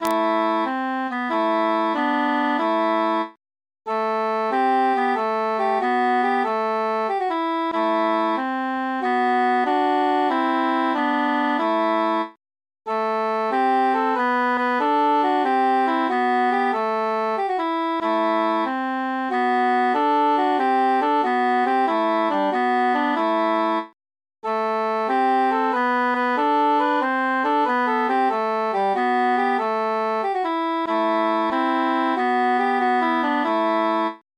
alle psallite transposed cantus mute

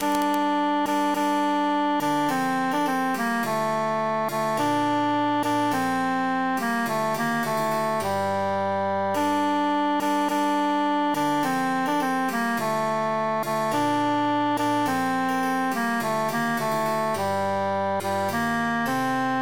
tenor emphasized; pastime with good company

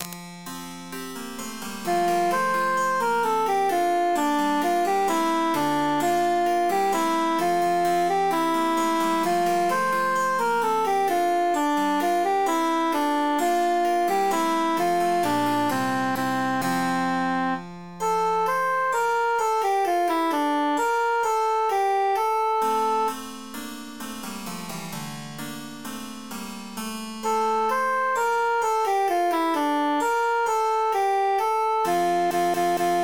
altus emphasized; au joly jeu

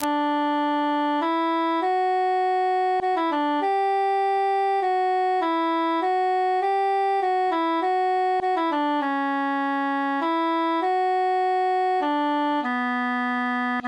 transposed tenor solo; aucun se sont

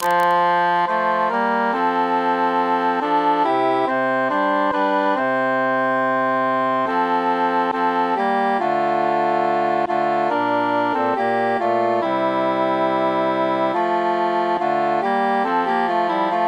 altus mute; tant que vivray

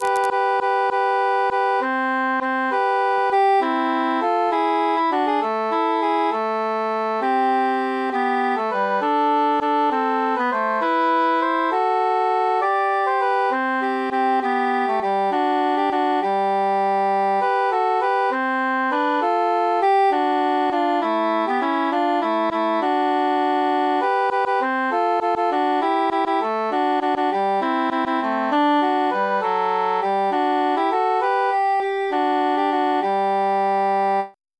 cantus mute; though philomela

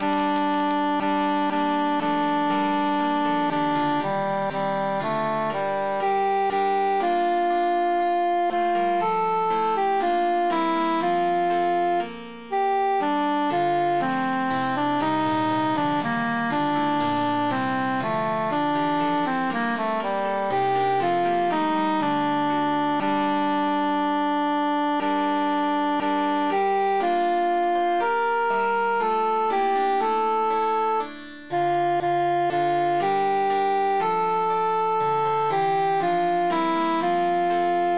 altus emphasized

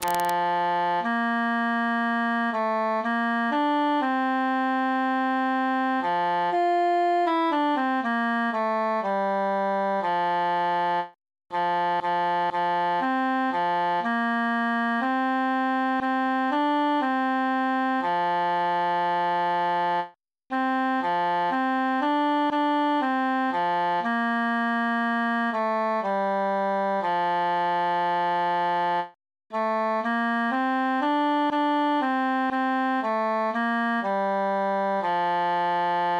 tenor solo; voi mi ponest

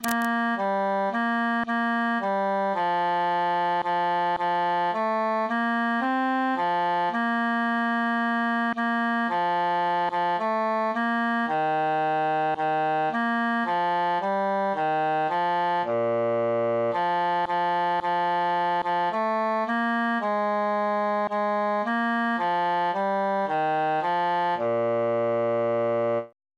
bassus solo; es ist ein schnee

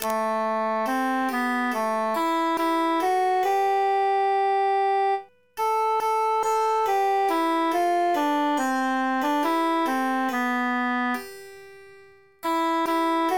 transposed altus emphasized; now wolde y fayne

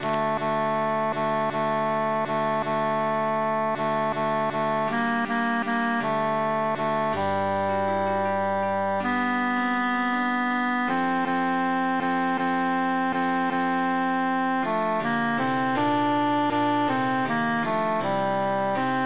tenor emphasized; chi passa

